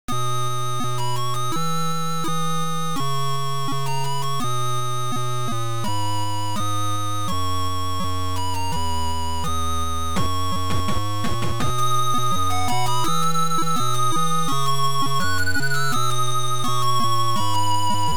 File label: Turn the Tape Over, Beeper; Gemini Wing